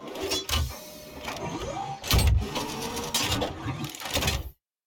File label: reload; Tacz1.1.5+; RSWS 1903